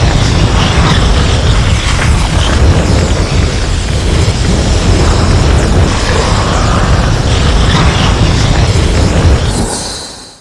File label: flamethrower fire